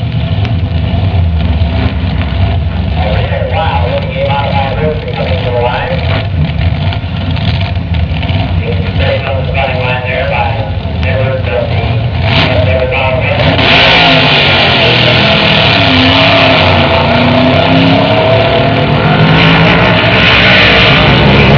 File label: drag racing